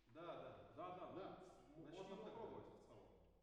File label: MKH AB, piano